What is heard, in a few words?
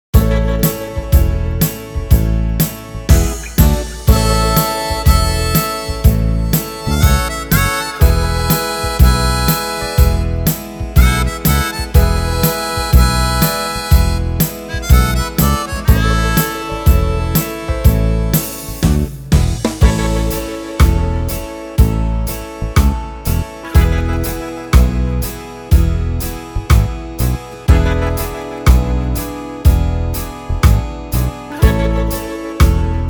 70s Darling Pop